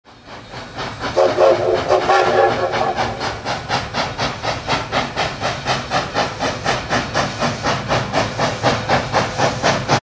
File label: slow train lead in; Garry's Mod; tf2